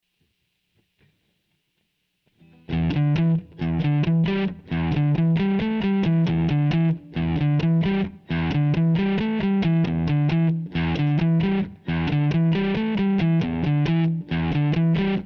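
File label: Guitar Groove E minor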